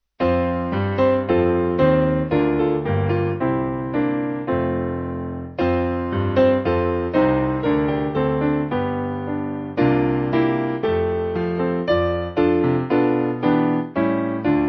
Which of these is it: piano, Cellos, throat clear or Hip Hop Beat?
piano